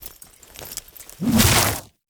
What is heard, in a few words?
Overhead Long Smash Attack Start; Enemies; Warframe; Grineer Nightwatch